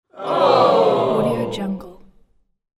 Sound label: disappointed group